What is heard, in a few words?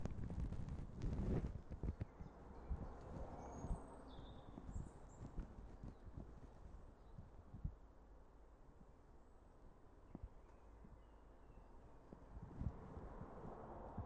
bank holiday birdsong includes collared doves as well as other birds